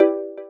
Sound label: chord